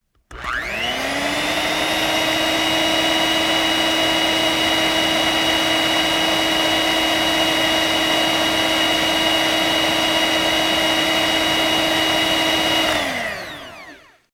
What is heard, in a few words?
hand mixer